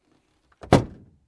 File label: Closing Car Door